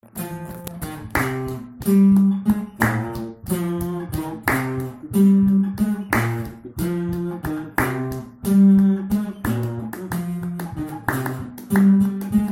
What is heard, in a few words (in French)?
basse 2 sur 5-4; Courcelles Sur Aujon